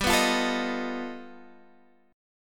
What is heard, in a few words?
G Augmented 9th, Guitar, Half Step Down